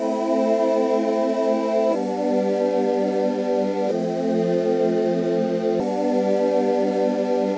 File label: keys, Loops, Sad